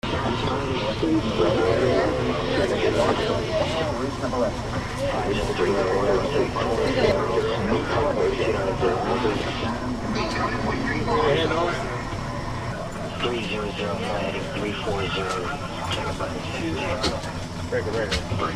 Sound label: military radio room; uss midway